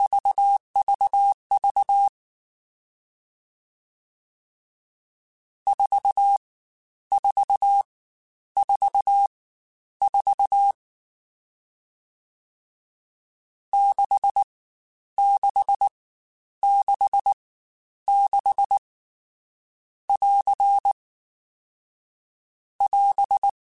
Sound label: CW Code Groups Mixed Short Runs Staccato; Morse Code; HamRadio